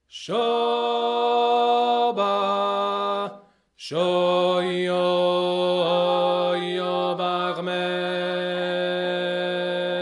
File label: Alilo (Imereti) Bass